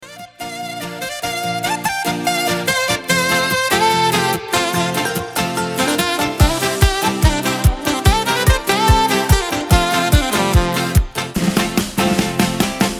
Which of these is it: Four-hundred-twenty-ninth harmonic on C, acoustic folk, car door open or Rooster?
acoustic folk